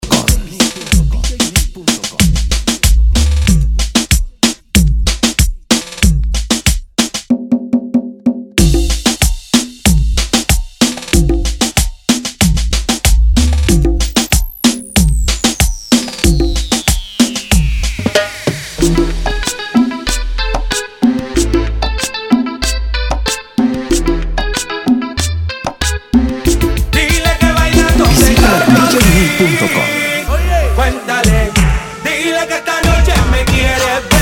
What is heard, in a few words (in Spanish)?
Acapella Hype Up Guaracha Remix